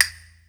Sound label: PC, Casio CTK-5000